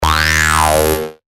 D#, bass shots; Beast; revealed bass shots vol 3

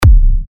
C, techno kicks; Finale; revealed techno kicks vol 10

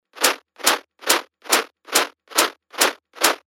140 BPM, techno loops; Kabuki; Revealed Techno Top Loops Vol. 9

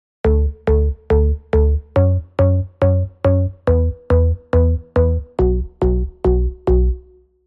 Offbeat, rolling bass; Offbeat Korgan; Revealed Serum 2 Rolling Bass Vol. 2

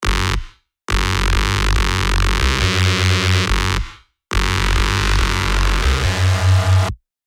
Reese, techno bass; spire; Colossus; revealed spire techno bass vol 1

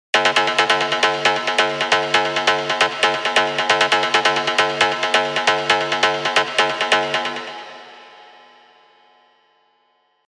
Stab FM; Revealed Serum 2 Big Room Techno Drops Vol. 1

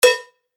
E Cowbell; revealed percussion vol 3

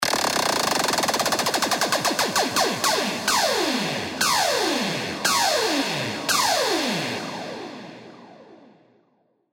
Laser Beams; FX; Fricken; revealed halloween horror 2025 edition

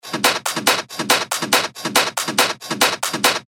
techno loop, 140 BPM; Wemby; Revealed Techno Top Loops Vol. 10

